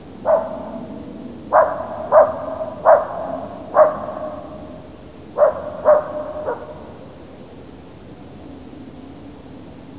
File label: dog; de pirates